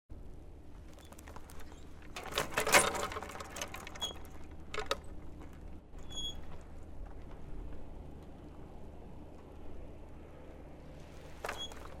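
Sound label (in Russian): swing on chains, pushed, it swings; Звук качелей раскачивающихся на ветру, металлических, на цепях или веревочных, закрепленны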